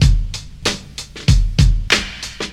95 bpm drum beat e key, Free breakbeat sample - kick tuned to the E note. Loudest frequency: 1150Hz